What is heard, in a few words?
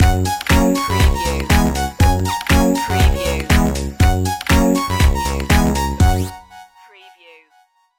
retro video game tomorrowland stinger